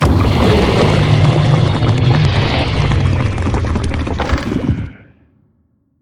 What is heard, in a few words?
thalamid gas release; Barotraumatic; Thalatocyte